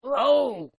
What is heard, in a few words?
move fail